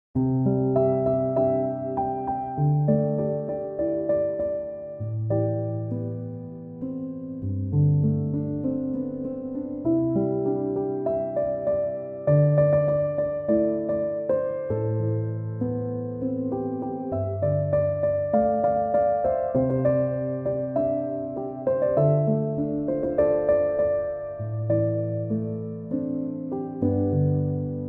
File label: chill piano instrumental